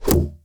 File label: wood hit